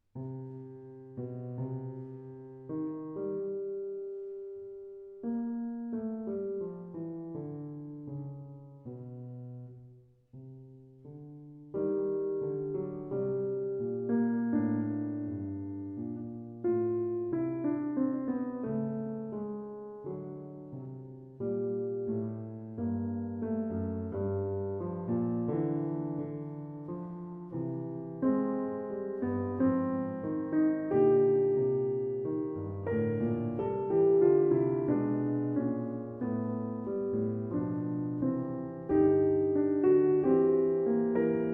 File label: Fugue in C Minor, Complete Four-Voices Exposition; Completed by A. Simonetto